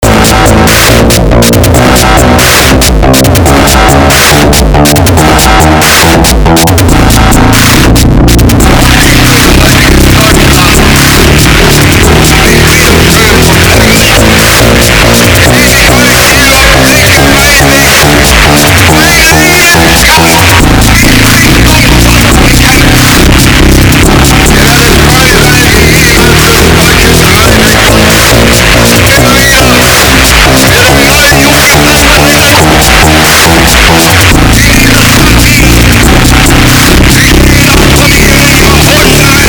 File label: stairs